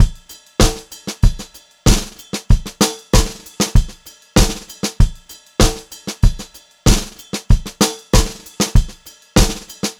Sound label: VARI, Pop-Rock Drumloops